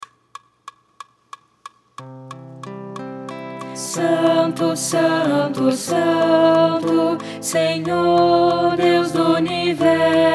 Santo tradicional Todos juntos

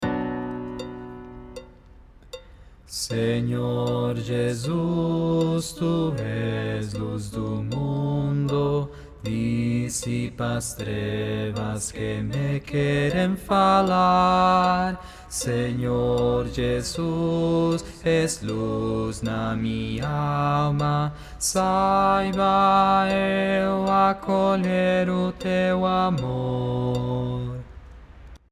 Jesus le Christ baixo

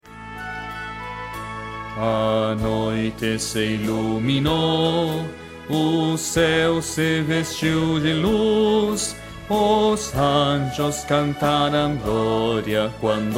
baixo

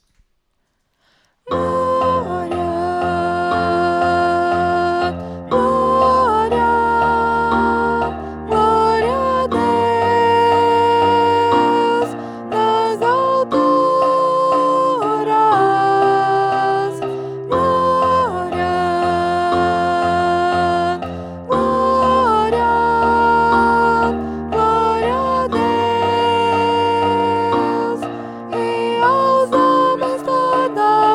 Glória Soprano

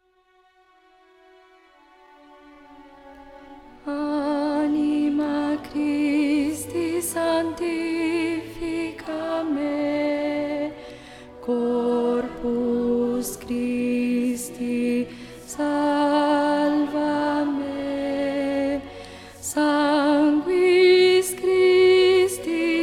Contralto; Anima Christi

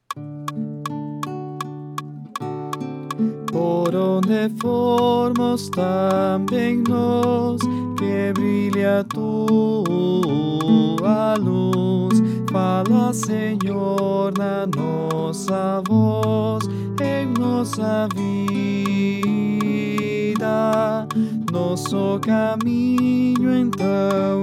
Vejam eu andei pelas vilas Tenor

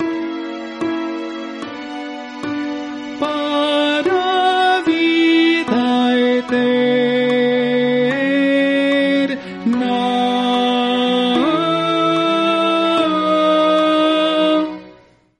Alt e Ten; casa

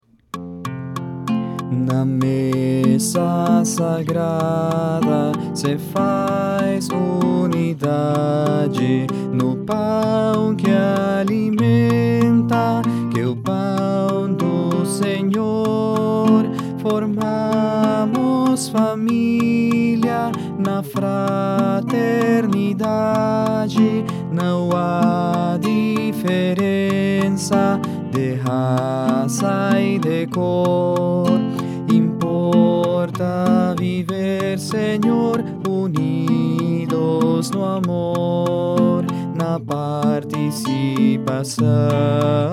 Na mesa sagrada Barítono

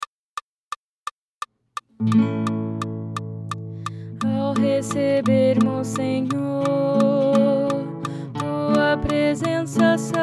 Comungar para viver Contralto

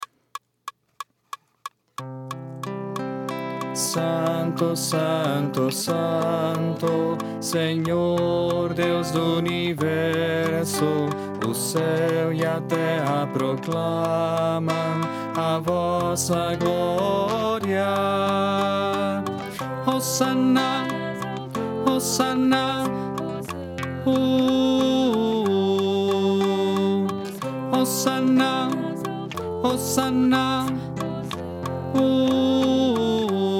Barítono

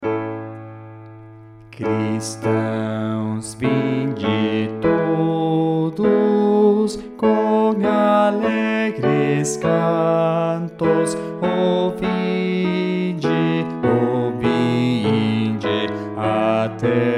Cristaos Vinde todos baixo

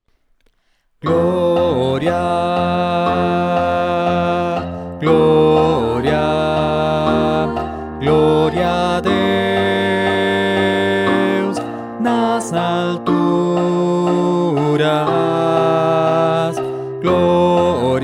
Baritono

